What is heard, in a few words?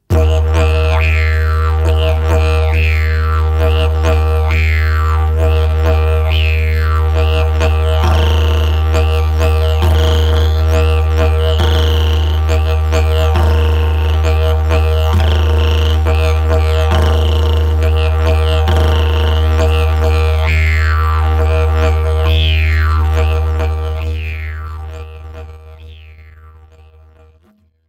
Didgewaves